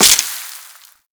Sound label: Forest Pinecone; Props; Warframe; Grineer Forest